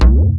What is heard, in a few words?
TALKING DR, Techno Trance; E-MU Formula 4000 Series Vol. 2